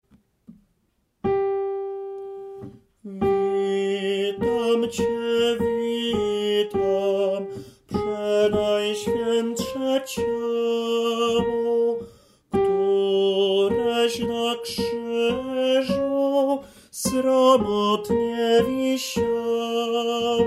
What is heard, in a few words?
TENOR; Witam Cie witam